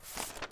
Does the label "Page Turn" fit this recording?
Yes